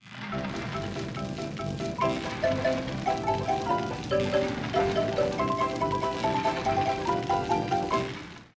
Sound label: BBQ Song